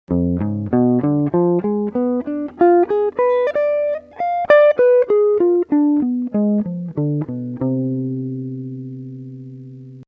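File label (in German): Verminderte Arpeggio auf und abwärts Auflösung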